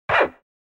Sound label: Electric guitar scraping on strings